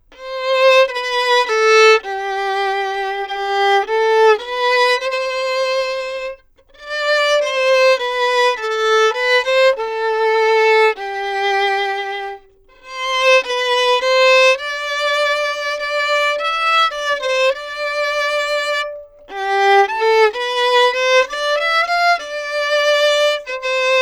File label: Bach Chorale